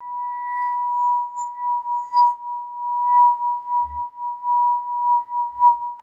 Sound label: squeaky rubbing on a crystal wine glass